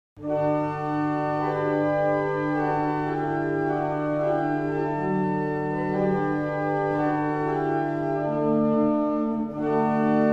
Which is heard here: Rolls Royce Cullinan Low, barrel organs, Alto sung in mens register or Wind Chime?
barrel organs